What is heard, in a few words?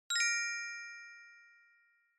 Glocken Low